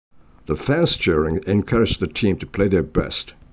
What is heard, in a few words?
The fans cheering